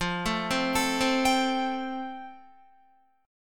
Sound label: F Major Add 9th, Guitar; arpeggiated